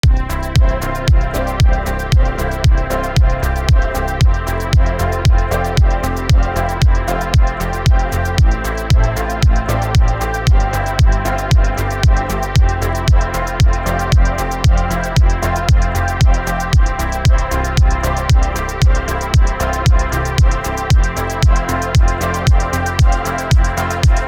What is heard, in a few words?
Synthpop Food Court; Synthpop Music Pack